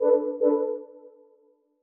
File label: Incorrect